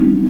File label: Sonar